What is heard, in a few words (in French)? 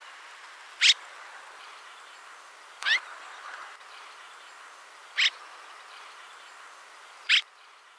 hirondelle rousseline